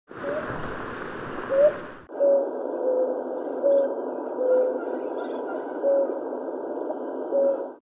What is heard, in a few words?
blue ground dove